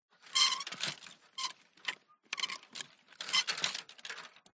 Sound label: household bedroom built in wardrobe coathangers rummage clothes